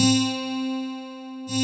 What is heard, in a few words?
C4, SynthLine; 90 s Sample CDs; NiceBeats; SynthLine VOL-33